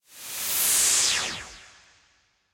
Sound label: mask light; Back to 70s; wazdan